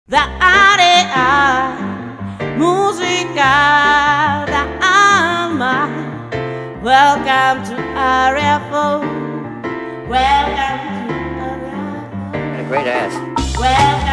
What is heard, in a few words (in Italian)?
welcome cantato